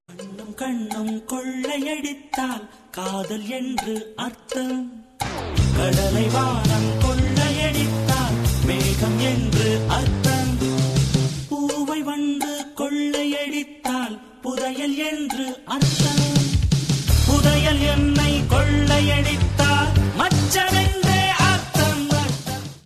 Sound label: Tamil ringtone